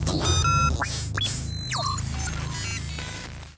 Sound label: Terminal Access; unsighted